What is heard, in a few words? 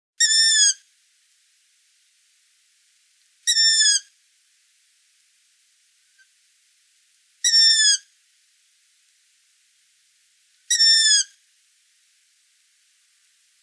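long-eared owl voice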